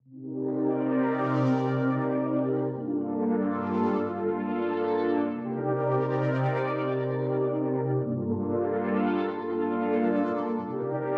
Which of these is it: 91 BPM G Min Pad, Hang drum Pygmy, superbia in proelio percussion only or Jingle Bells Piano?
91 BPM G Min Pad